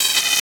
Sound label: Static; Blastwave